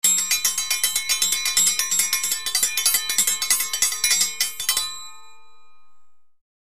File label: Triangle Higher Tone